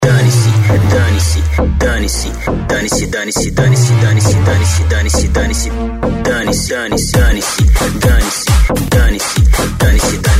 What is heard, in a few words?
electro funk; na balada